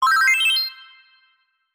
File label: Collect Crystal Coconut; Donkey Kong 64